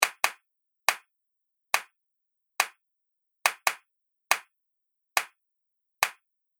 Hand Clap Groove Loop; Percussive And Rhythmic; Seamless loop

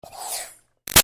Steam Release Iron Chuff Doppler; Miscellaneous Household Sound Effects; Big Room Sound

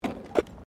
Tram Horse Drawn Horse Step Gravel

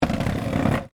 Wood Chair Slide, Drag; Foley; Wood Sound Effects; Wood (Sound Effects:Materials); BlastWave

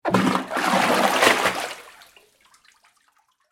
Water Object Surface, Gurgles; Nature; BlastWave

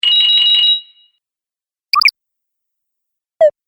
Videogame Fx; Sound Pack 6; Video Game Sound Effects

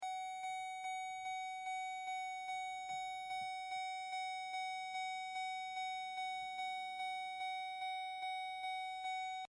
Truck GMC Savana Chime Door Open; Vehicles

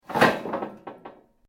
metal rods move; Sound Effects:Materials; Foundation3

